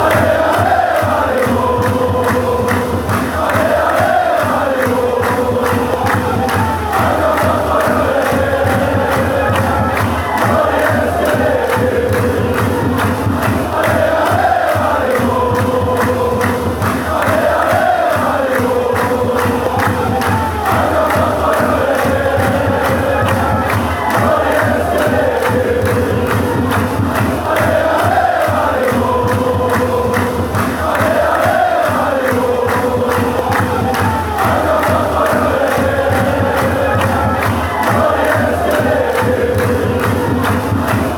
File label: allez oh, fanchants